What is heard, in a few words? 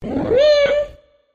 connect; monstergo; pokebot